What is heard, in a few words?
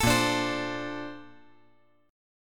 A sharp Major 9th; strummed; JGuitar